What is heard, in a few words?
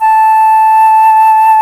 FLT C FLT, C Flt Brt